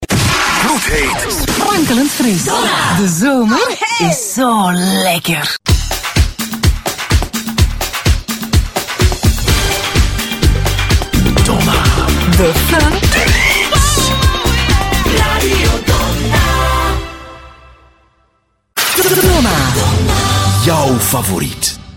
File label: jingles